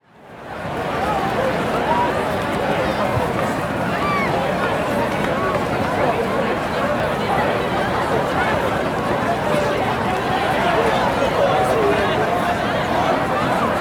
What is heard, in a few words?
Fair Busy Crowd Outdoor Street Fair, Walla; Sound Ideas; Walla Series